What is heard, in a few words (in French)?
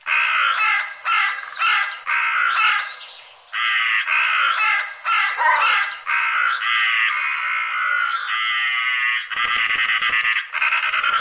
corbeau, oiseaux